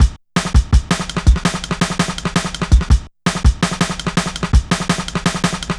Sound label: Shuffling Live, Jungle Drum Loops; Zero-G Jungle Warfare 1, 2 & 3; 90 s Sample CDs